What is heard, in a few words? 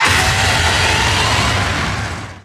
imp dragon roar